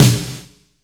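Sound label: wet snare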